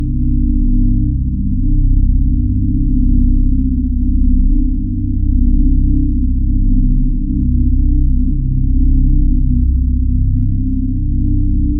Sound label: Low Ambience; color vision